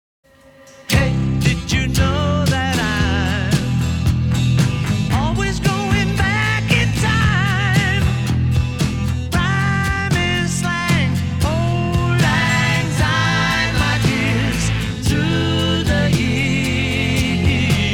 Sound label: wings backwards